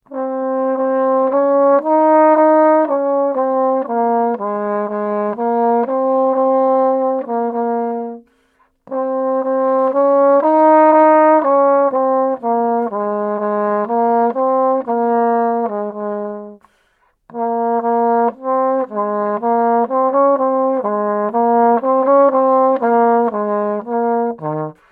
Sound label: Horn